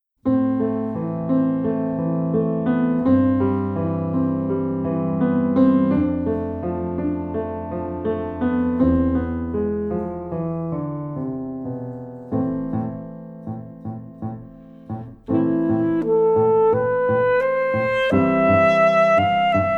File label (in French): saxophone et piano; Escapade musicale saxophone